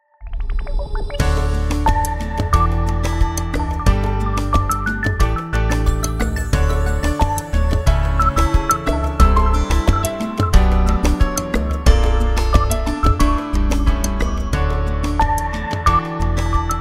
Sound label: ringtong; Gionee GN205